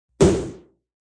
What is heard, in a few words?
Hit Snare; Rollover or button sound perfect for web pages, flash animation, and other web applications.; Musical